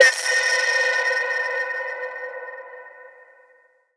Synth; 7rystan's 700+ FREE COLOSSAL EDM SAMPLE PACK; SFX

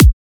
kick soft grass short; drums; base pack

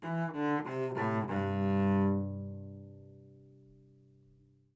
live string phrases, string phrase Cmaj 100bpm; bespoke - Floral v.1

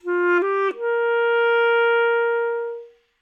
clarinet phrase Bbmaj 100bpm; live clarinet phrases; bespoke - Floral v.2

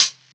Hat; 7rystan's 700+ FREE COLOSSAL EDM SAMPLE PACK; Percussion; HiHats

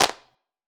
clap; bespoke - Floral v.1; drum oneshots

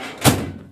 Drawer closing fast, Foley; SFX; 7rystan's 600+ FREE EDM SAMPLES & DRUMS

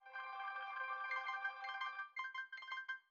granular piano Cmaj; bespoke - Floral v.2

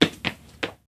Stumble steps; Foley; SFX; 7rystan's 600+ FREE EDM SAMPLES & DRUMS

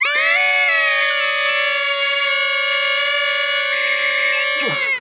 "Yay!"; SFX; 7rystan's 600+ FREE EDM SAMPLES & DRUMS

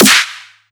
Snare

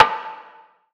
snare; 7rystan's Snare Pack 2018

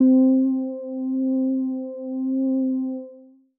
key; bespoke - Floral v.1

